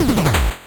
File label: 8bit se